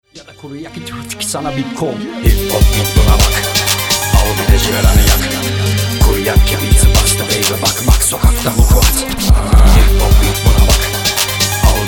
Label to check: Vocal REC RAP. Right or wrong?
Right